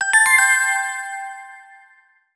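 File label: Text Message; PlusFourSix; Venus; Lars Edvall; X1 Family 2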